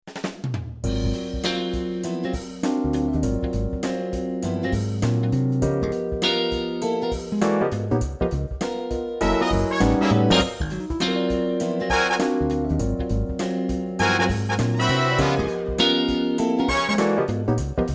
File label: funky; rock and pop